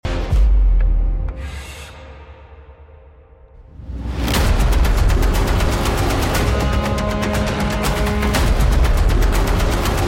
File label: cinematic trailer intro